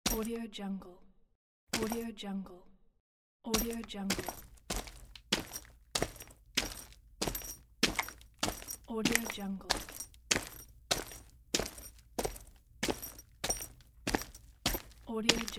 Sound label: footsteps walking on asphalt